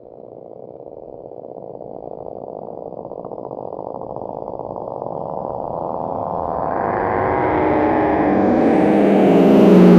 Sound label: wheel of fortune wheel, game show